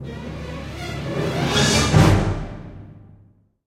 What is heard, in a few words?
Suspense Stinger